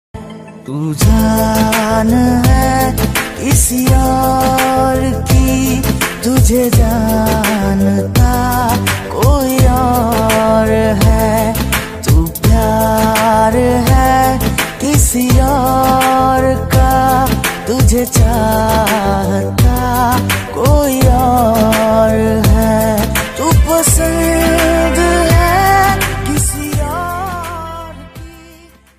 Romantic Song Ringtone

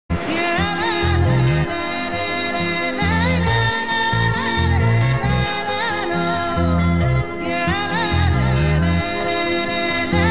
Sad Tamil Bgm Ringtone

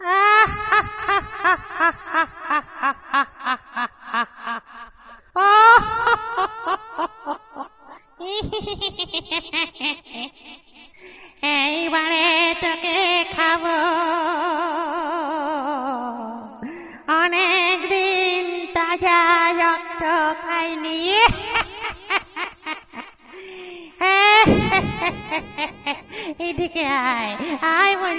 Ghost Fully Horror Ringtone; ringtones

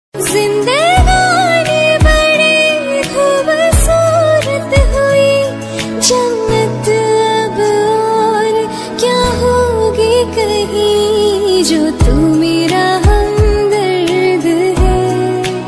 Ringtone Female Version

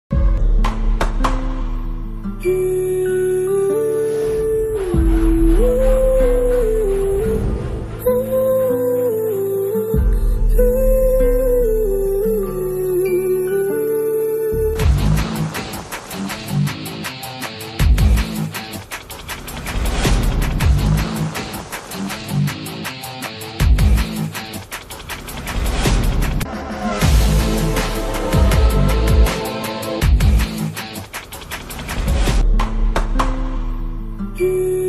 Movie BGM Ringtone; Majili Movie

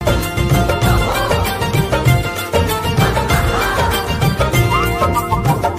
Trending Old Music Ringtone; ringtones

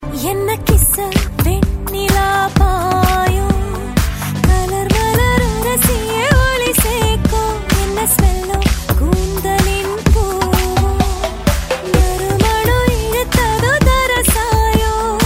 Tamil Female Ringtone; ringtones; Mersal

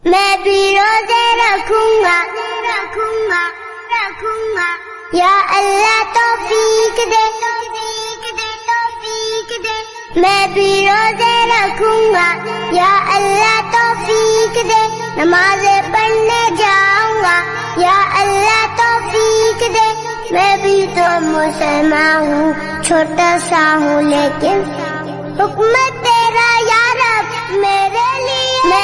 Islamic Ringtone

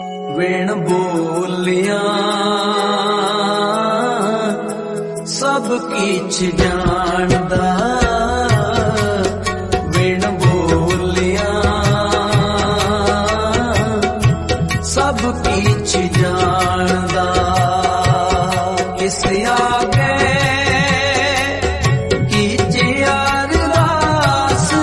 Gurbani Bhakti

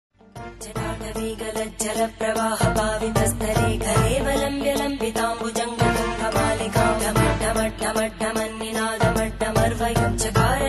Powerful Mantras Ringtone; Lord Shiva; Shiv Tandav

